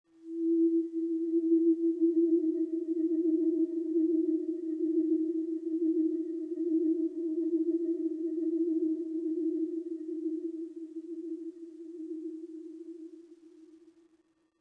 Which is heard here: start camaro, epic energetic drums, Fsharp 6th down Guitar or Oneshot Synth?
Oneshot Synth